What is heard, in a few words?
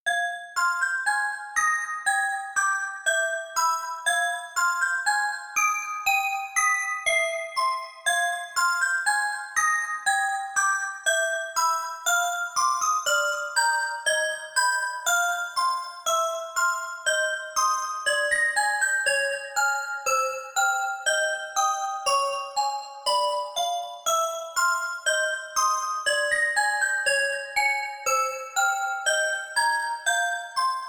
Lullaby